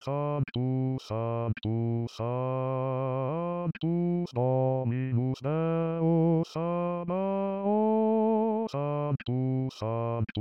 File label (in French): basse; messe de saint jean